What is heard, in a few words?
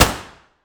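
weapon dmr sup fire player atmo int; gmod; viper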